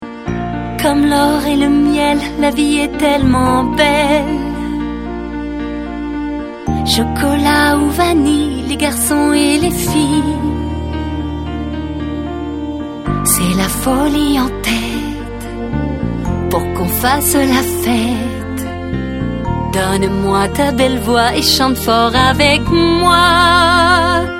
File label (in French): chant enfants, joyeux anniversaire